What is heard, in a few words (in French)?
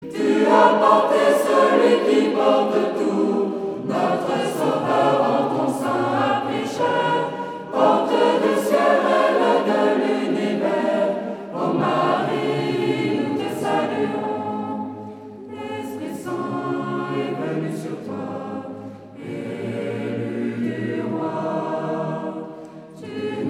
Chorale; Chorale Totus Tuus; Tu as porte Celui qui porte tout